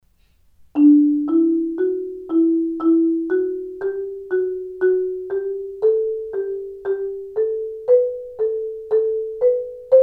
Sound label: mallets